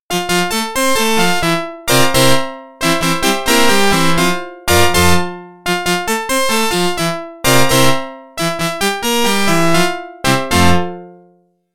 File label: Organ; NHL 94